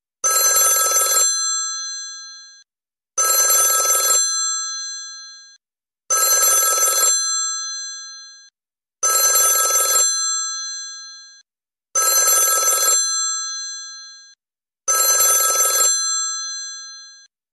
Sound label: old ring